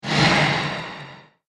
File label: Industrial Gas Swipe, Whoosh; Whoosh Sound Effects; Sound Design